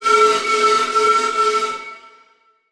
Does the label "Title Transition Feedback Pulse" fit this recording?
Yes